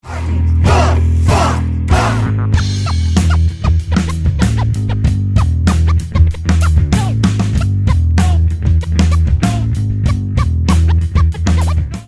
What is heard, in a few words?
Scratching ringtone